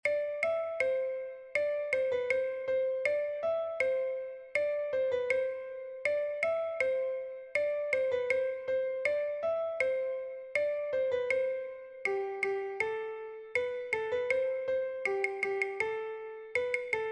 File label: Scottish, vielle a roue; stage de vielle a roue